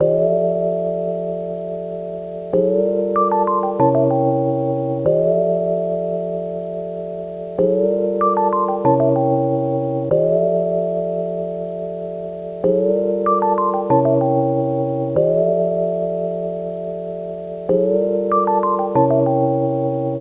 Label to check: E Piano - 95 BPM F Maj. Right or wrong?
Right